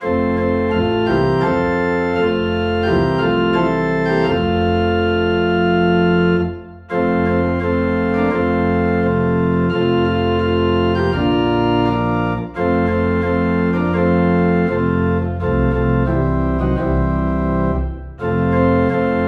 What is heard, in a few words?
Organ Low in F